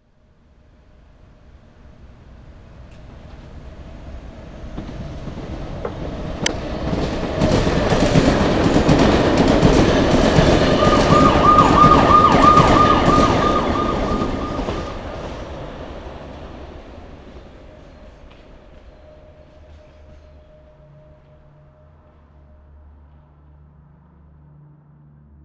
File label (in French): ambiance ruelle; Ariel Harrod; l'amant